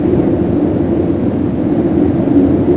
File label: cave; WhiteOut